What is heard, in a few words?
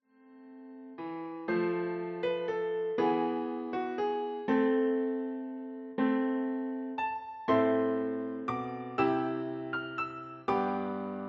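Greensleeves, Klavier, 4 händig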